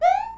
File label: jump; marioroyale; allstarssmb